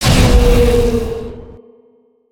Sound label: howl; creatures organic